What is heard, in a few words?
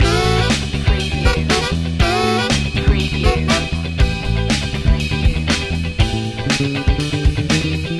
funky feet loop